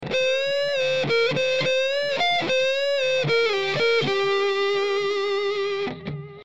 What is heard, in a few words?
Overdrive Bending